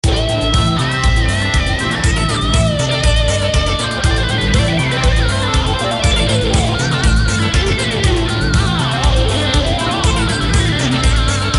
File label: Solo improvisation; assoli e improvvisazioni varie